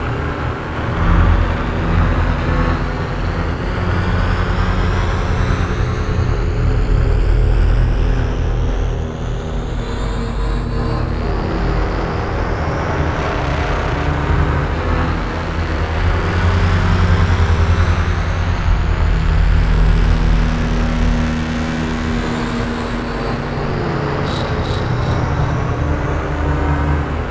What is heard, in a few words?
star eater weapon windup distortion; Stellaris; nemesis